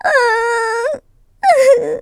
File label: Cry; Princess Styla crying